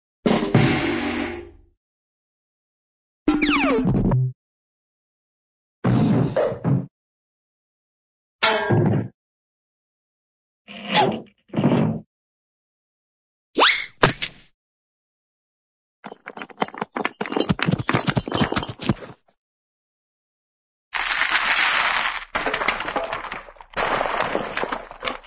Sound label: fall, cartoon drum body slam